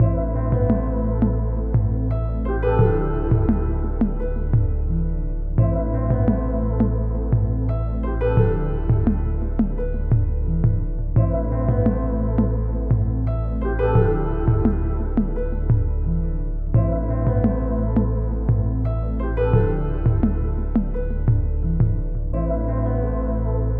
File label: 172 BPM A Min, Melody Loops - RnB; Sad Secrets; Cymatics; Mystery Pack Vol 4